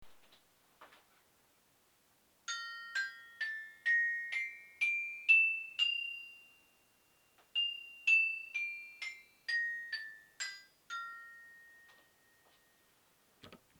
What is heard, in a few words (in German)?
Glocken, toypiano